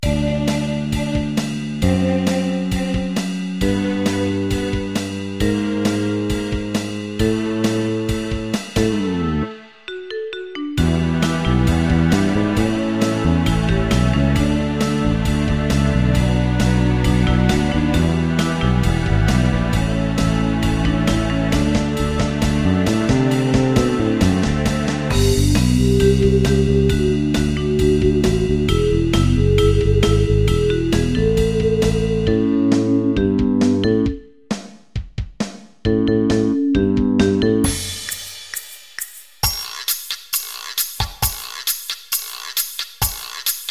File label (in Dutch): Vibrafoon; Schoollied-Remix